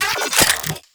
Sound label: Rifle Reload; Weapons; Warframe; Corpus; Ruban